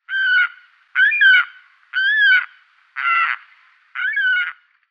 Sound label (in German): Wuergfalke Falco cherrug